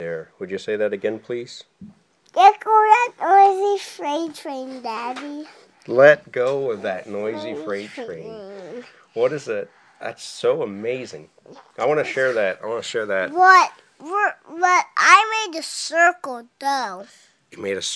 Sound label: noisy freight train